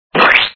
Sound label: splat; shinobi-kun